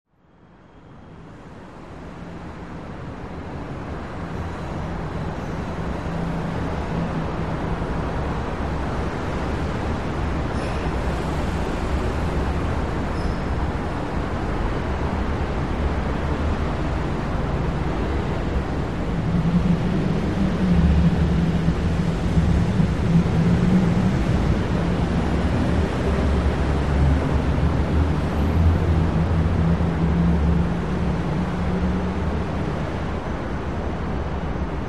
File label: Parking Garage Busy